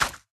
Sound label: Elite harassers Arrow storm Single; Elves